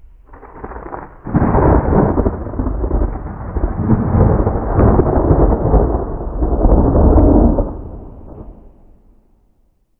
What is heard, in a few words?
Amb Weather; Red Orchestra Ostfront 41-45